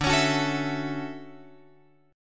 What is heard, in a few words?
D-Major 9th, D